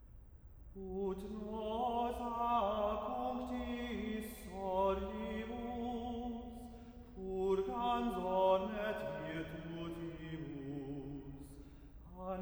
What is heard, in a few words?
Verse 4 Chant